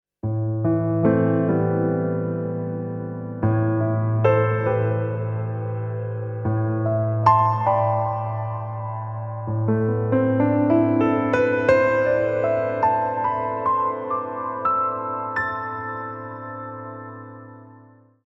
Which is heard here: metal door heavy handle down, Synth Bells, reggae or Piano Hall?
Piano Hall